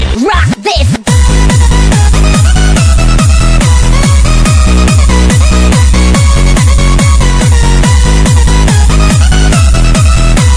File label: I Like Techno